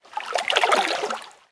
Ice Fields, lake; ice age scrats nutty adventure

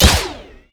Crit

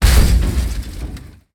Helijopter Debris

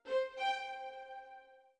orbit violin; Sky Children of the Light gamerip

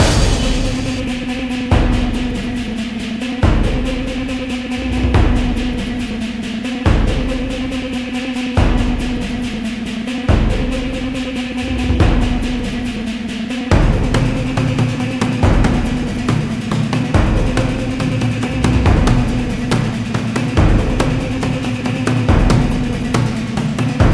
Suspense; Prototype

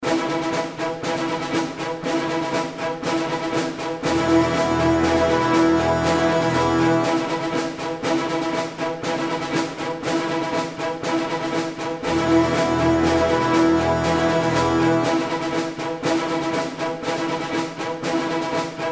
Ascending Tension

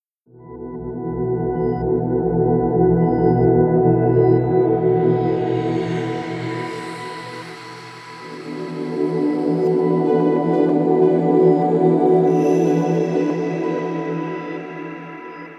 Door; Judgment; Judge Eyes; Shinigami no Yuigon

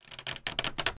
Longbow Pull Back; Timelock VR

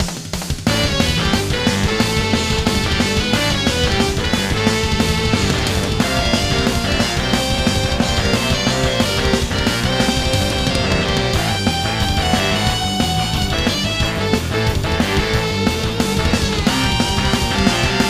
Game Start; mario tennis ultra smash 2015 wii u gamerip

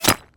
Bullet Impact Concrete Brick; jasem just another shooter with electronic music windows 2017

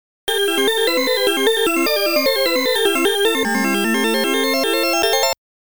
High Score Music; BOSCONIAN

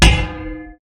Hit Spade Generic

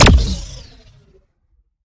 Sciweap Shot Medium Blaster Proton Rifle

